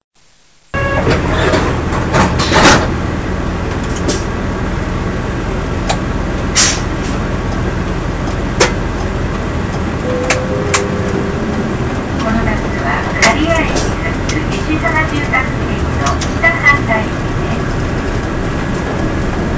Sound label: bus tape orgel; aichi; chitabus